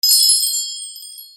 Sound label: BELLS